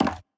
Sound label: reels stop